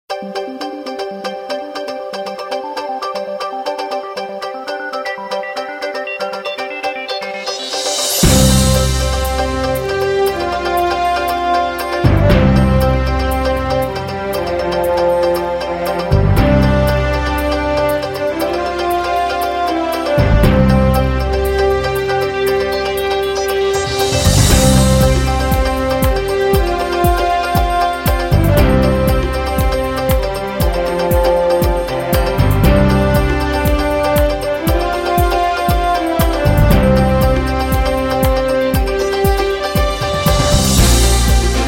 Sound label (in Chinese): 豪迈的背景音乐